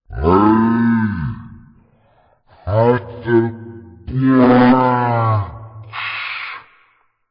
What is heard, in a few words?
train brakes; vapesquared